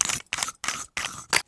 silencer off; usp-s; cstrike; csgo

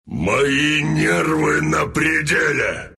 knife kill

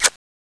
clip out, weapons; ssg08; cstrike; csgo

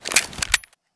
draw, weapons; cstrike; csgo

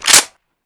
m4a1 mag tap, weapons; cstrike; csgo

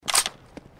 m4 reload cock, weapons; csgo

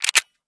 elite slide, weapons; cstrike; csgo

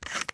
m4a1 silencer screw, weapons; cstrike; csgo

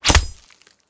hit wall, knife; cstrike; csgo

